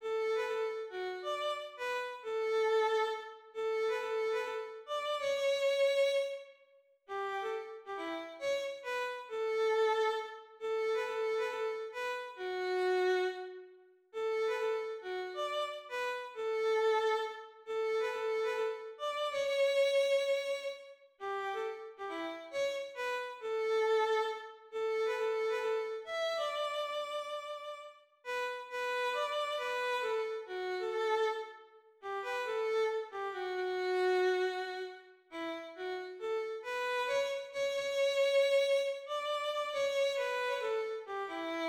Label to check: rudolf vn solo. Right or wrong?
Right